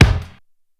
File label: SPEED KICK, drums; Basement drums vol 2